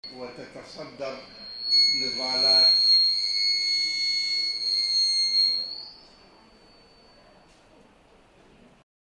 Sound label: loop